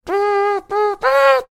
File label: playing taps mouth trumpet